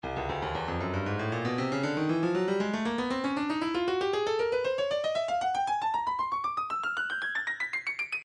stylus percussion midi files tambourines 117 tambourine 16ths